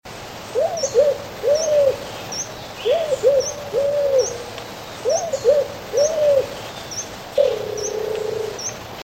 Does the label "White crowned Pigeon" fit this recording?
Yes